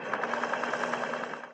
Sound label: roll